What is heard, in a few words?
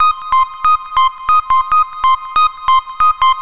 Love Lead; Сэмпл синтезатора (Техно-транс); Techno Trance; 140BPM; loop; Synth